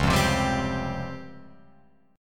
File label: C sharp Major 7th Flat 5th C sharp down Guitar Open F; C#M7b5 strummed; JGuitar